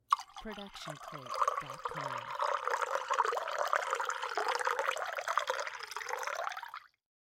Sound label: liquid cup pour; soundscrate